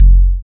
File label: kick round click, plugg airy